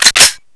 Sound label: ump45 bolt pull